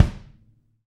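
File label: bass drum, Roland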